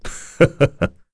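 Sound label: Vox Laugh, Korean; heroes; Bernheim; kingsraid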